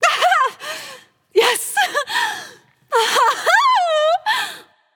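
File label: yay, female; smite; voicepack; hera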